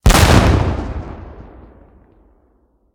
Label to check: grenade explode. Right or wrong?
Right